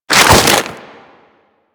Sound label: Infested Moa Flip Foley; Warframe